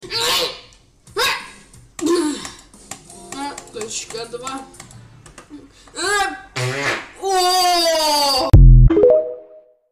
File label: Fart woah